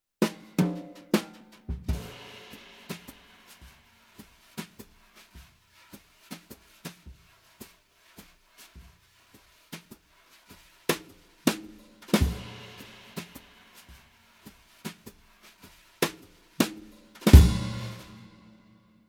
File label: Jazz; Korg KR-55 Pro